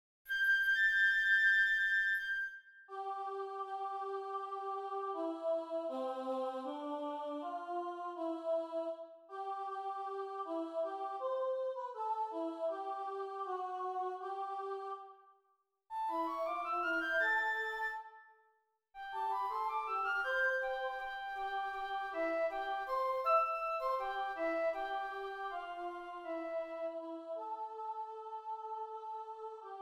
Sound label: choir flute; 1부-축도송